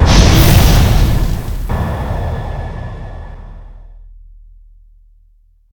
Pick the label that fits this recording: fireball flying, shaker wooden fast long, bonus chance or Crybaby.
fireball flying